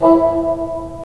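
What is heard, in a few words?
wind bassoon; LA Composer vol. 3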